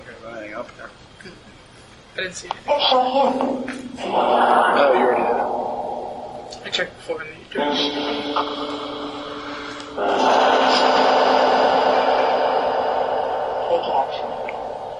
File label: Attic Phasma; 1810 tavern investigation return